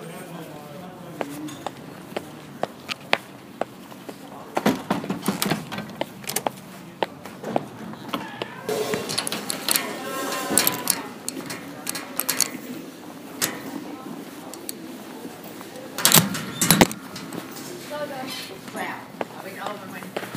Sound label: school soundscape